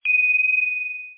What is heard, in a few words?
chime